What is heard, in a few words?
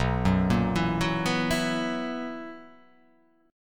B Major 7th Suspended 4th B down